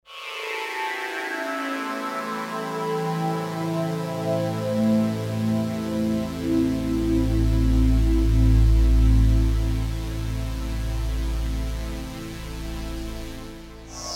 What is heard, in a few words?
Band Pass Pad